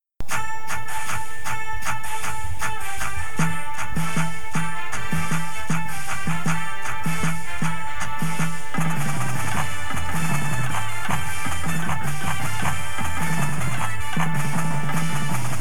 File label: full drumline w music